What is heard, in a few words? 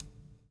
PULL, Backbeat; Spectrasonics - Backbeat